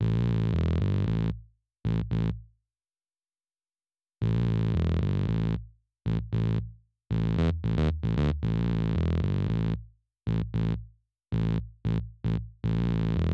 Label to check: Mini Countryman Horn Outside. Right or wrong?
Wrong